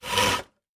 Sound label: handsaw stroke